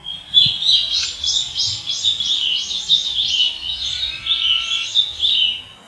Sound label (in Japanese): hiyodori; zukan